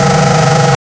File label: formula 1 car accelerating